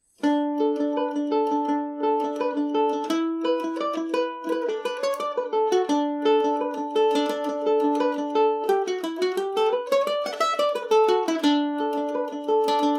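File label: killavil reel D major, mandolin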